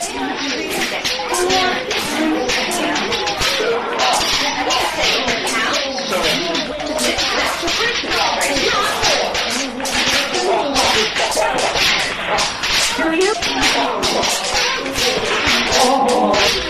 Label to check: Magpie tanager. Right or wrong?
Wrong